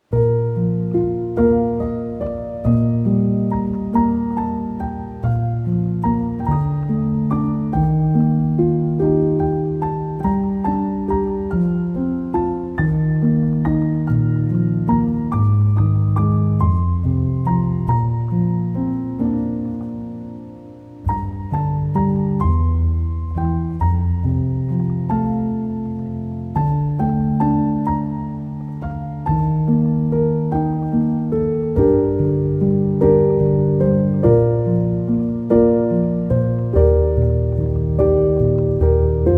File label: Piano; When Marnie Was There